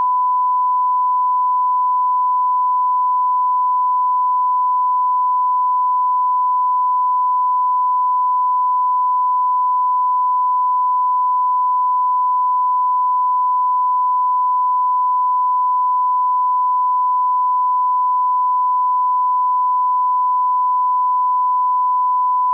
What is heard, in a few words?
1kHz tone